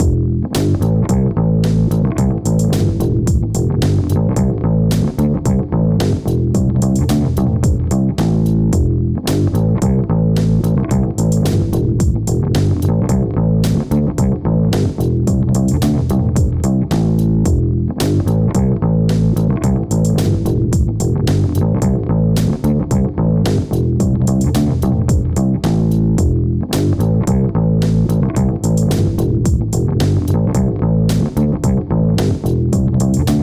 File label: Bassing